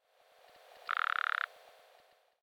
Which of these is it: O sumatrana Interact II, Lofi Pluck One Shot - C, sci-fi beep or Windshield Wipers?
O sumatrana Interact II